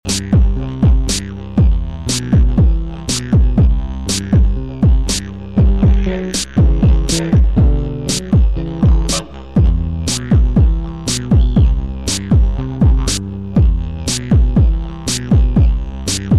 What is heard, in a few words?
didj